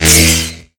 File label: slash elect